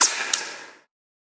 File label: bullet impact ricochet